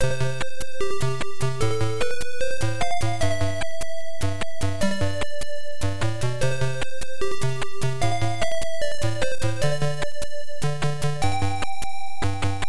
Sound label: System Menu; Xak -The Art of Visual Stage; MSX2; PSG